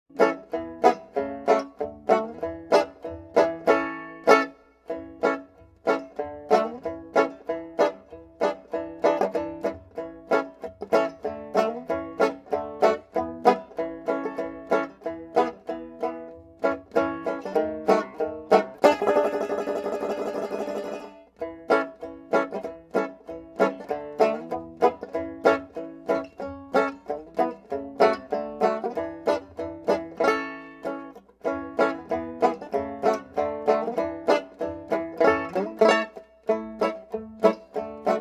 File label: Guitar; Assassin's Creed Syndicate